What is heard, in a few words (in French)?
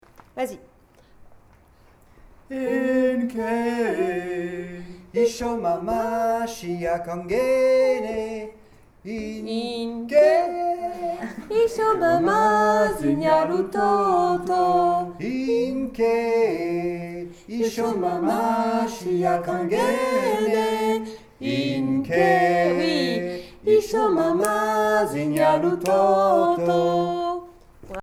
Kukhona 2eme partie basse